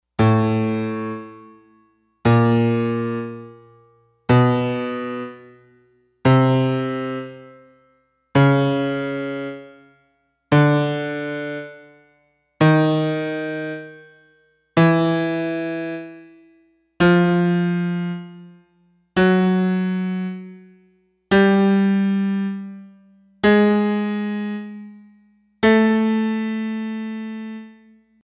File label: piano